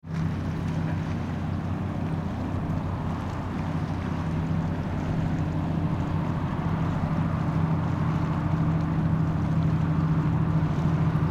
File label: ship